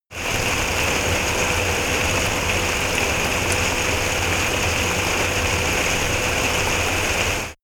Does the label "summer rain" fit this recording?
Yes